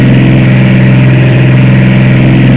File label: Transall, Model; FMS